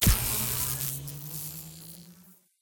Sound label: Environment Conduit hand grab coil